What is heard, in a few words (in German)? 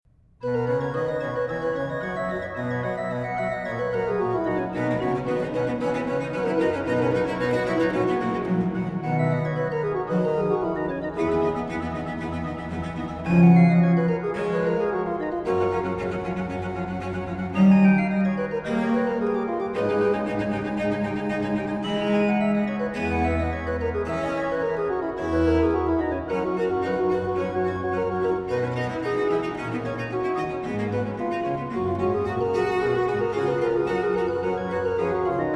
Sonate C-Dur; Johann Matthaus Leffloth; fur Viol